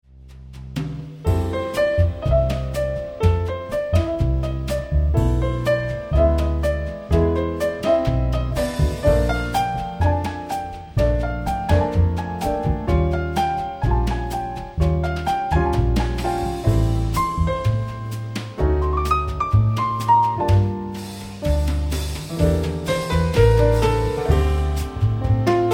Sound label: Samba, jazz trio